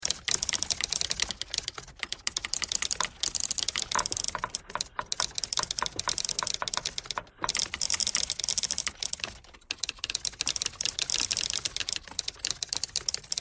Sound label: typewriter thread all